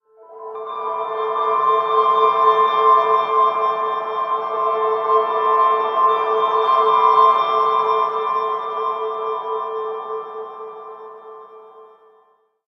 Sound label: Map Piano; No Man's Sky